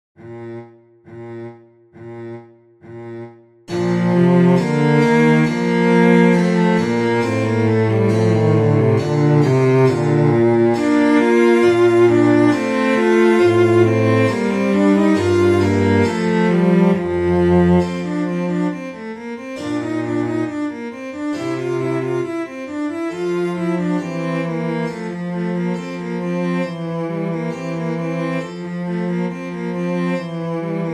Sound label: Cello Sonata, cello music; Vivaldi; Cello Sonata1-4; チェロソナタ第1番第4楽章